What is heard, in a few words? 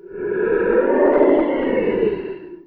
Megalodon roar; Pressure